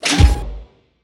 robot jump; boss

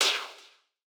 dash

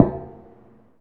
footstep metallic; The Swapper

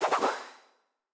hexa triple shot; CrossCode; battle

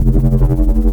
wave spin; CrossCode; battle

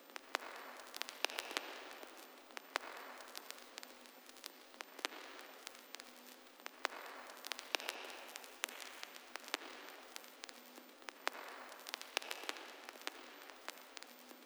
ambience vinyl; The Swapper

